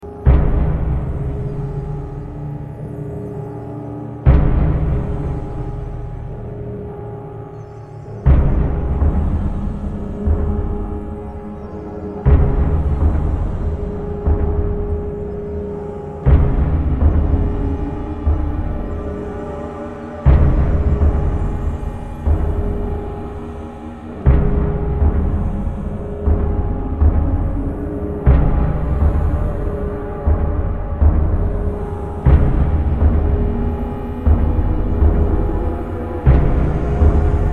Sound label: Terran Defeat; StarCraft Remastered: датамайн